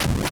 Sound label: axe hit lowbit